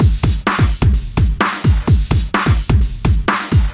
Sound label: House Beat, 128 BPM